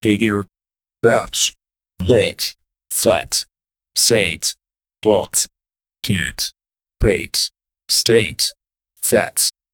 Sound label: count down, alert